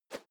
whoosh feather; swooshes-swishes

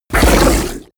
fishman roar attack short; kreaturen

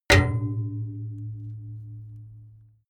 metal impact small hard long; hits explosionen; metall

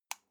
light switch on soft

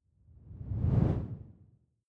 whoosh simple incoming low; swooshes-swishes

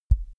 microphone pop on soft; objekte; elektronik

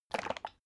bottle syrup upend glug

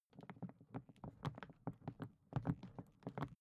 briefcase plastic walking movement; industrie wirtschaft; bueroarbeit

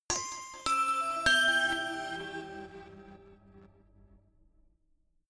notification glockenspiel crazy triad up major; instrumente

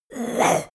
monster gasp; kreaturen

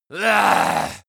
male die; koerpergeraeusche; menschen; soundpool

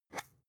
spice jar scratch tonal open fast; industrie wirtschaft; gastronomie

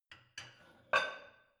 barbell light plate put on hit soft; sport freizeit; fitnessstudio

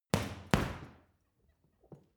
boxing punching bag side hook double hard; sport freizeit; boxen

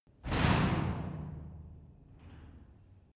garage door shut

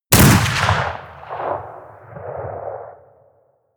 rifle remington rolling block crisp stone; schusswaffen

